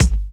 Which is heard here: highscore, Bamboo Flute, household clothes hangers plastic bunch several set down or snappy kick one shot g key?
snappy kick one shot g key